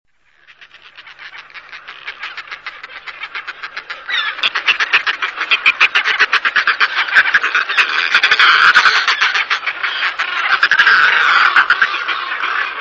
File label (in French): fulmar boreal, oiseaux chant